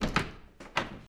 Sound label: Door Open